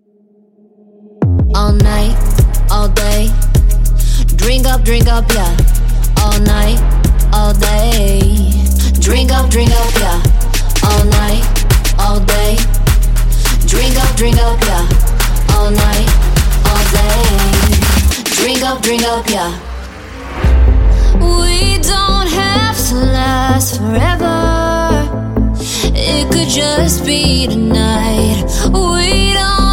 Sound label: Vocal Pack; Splice